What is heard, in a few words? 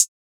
closed hihat, drum one shots; Cymbals; Hihats - Closed; Cymatics; Cobra Hip Hop Sample Pack